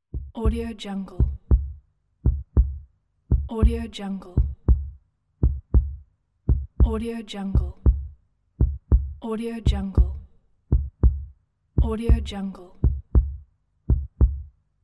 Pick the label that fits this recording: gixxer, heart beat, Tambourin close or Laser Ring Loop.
heart beat